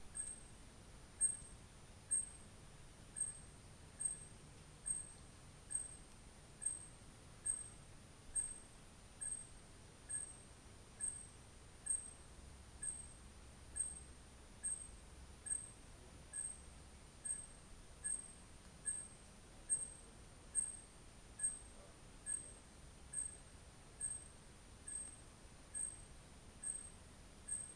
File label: a real bird tweeting in Africa but sounds digital to me